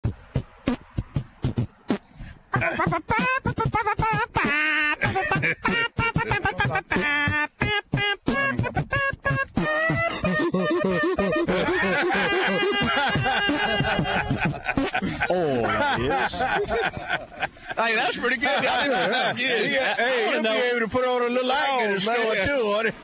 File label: Beat